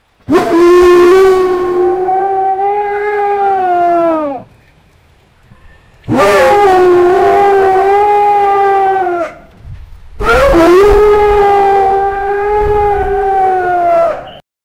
a bear attacked a man, danger

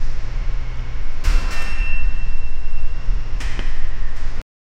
airy warehouse reverb, ambient hum

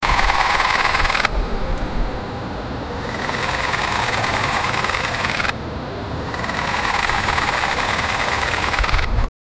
sound professional photog, photography

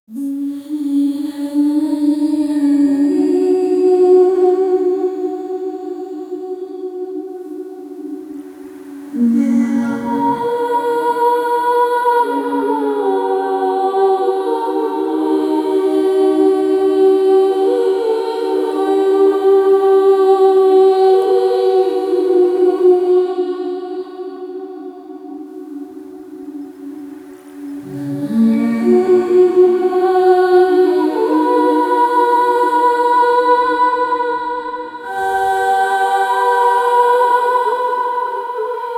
a beautiful and enchantin, mythical

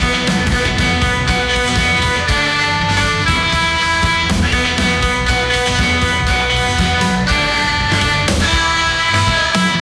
police crime live music

